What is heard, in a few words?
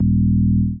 wrong answer buzzer